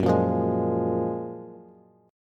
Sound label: Gb Major 9th, down, Bass; GbM9; strummed; JGuitar